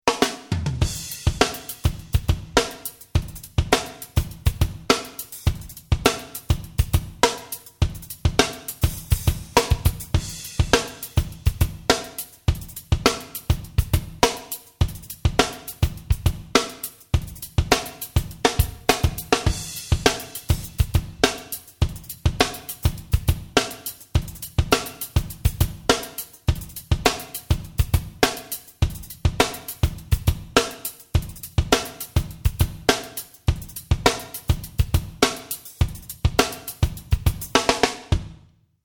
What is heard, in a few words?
Room